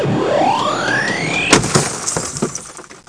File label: balll; GEOGRAFIA